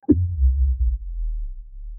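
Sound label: dark trumpet sound; dark ambient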